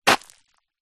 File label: shoe kick gravel stones on road or path; zapsplat; foley